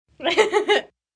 Laugh Short, Laugh, 1 girl, 13 yrs, short, chuckle, human voice, child, kid, female; Laughter Sound Effects